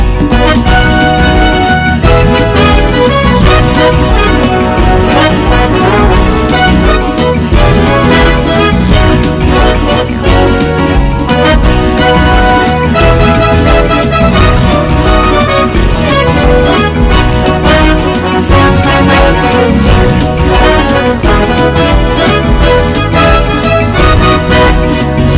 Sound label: Hot Fox Trot